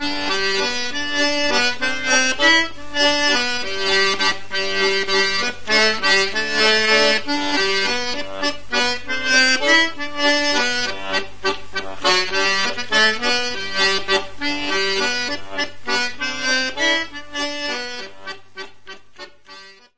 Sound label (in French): Valse - France; Valse oubliee (La)